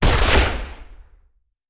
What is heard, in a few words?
Rifle; Sound Ideas; Guns